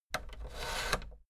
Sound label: fader plastic scrape double down medium